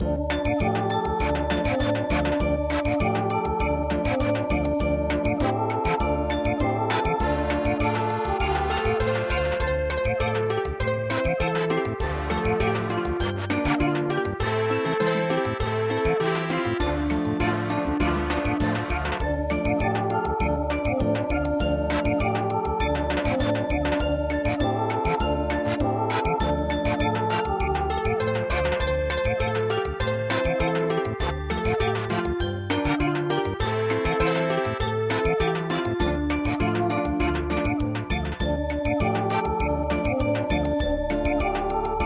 chonko bushi Samba

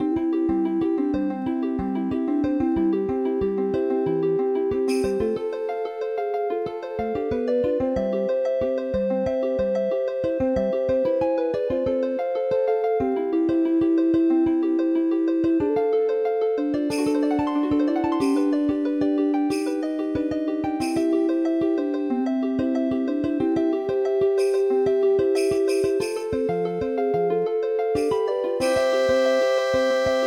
Lullaby Deep Sea

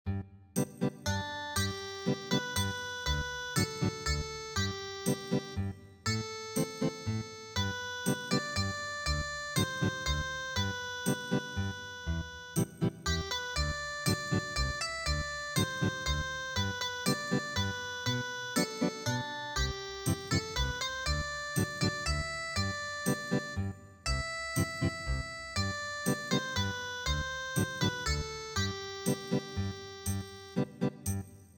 Ethnic Guitar, traditional; Durchs Wiesetal Gang I Jetz Na

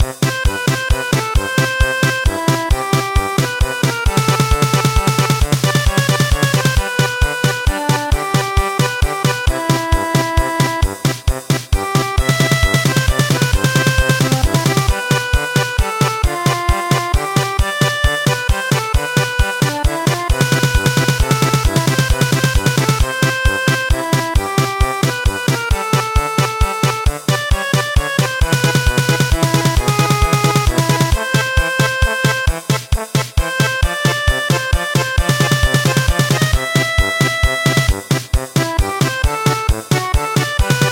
German Polka

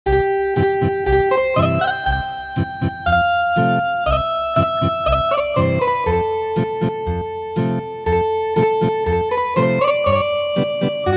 Ethnic Guitar, traditional; The Whisper Of West Wind

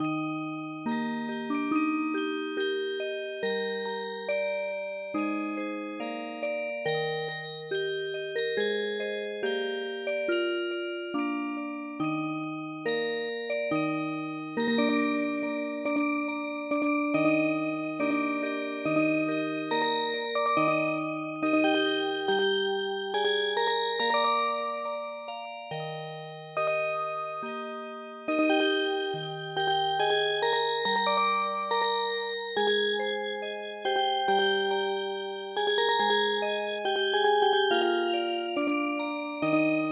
Music Box

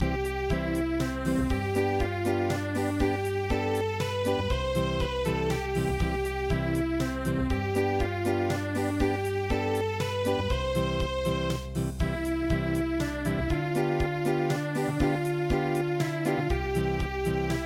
Unplugged

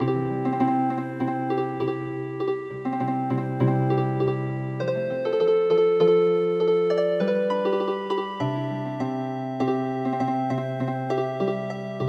Healing Harp; English Coast Song

